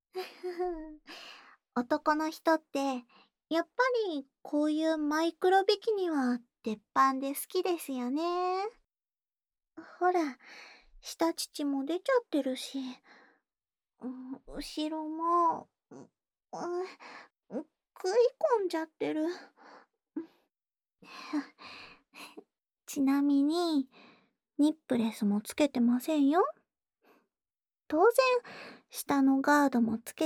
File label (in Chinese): 温柔的接吻